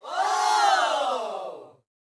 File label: Ambient Sound; pangya fantasy golf pc